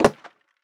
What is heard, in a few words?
Snare